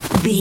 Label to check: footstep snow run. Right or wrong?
Right